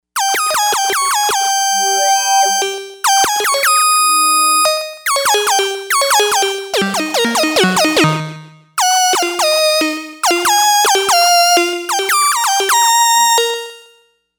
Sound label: Key Off Oct, Novation UltraNova; Factory Patches